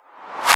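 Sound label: Boss DR-202 percussion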